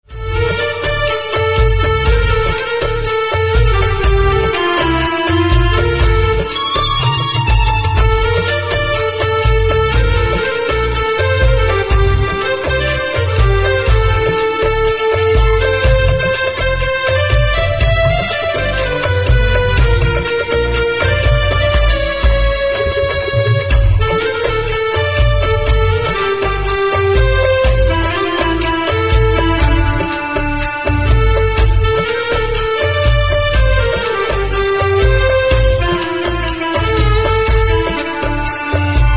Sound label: Harmonica Instrumental Ringtone